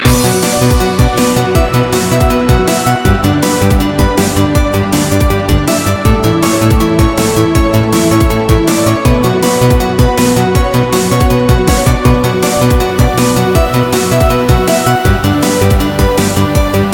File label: Trance Romance